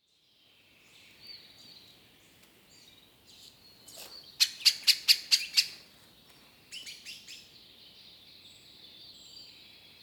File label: birds wood binaural; Miradouro de Macela